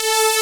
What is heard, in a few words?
saw lead synth; Techno Trance; Ueberschall; Techno Trance Essentials